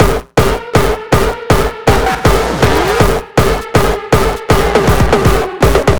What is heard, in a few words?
Beats Loop; Anger Foot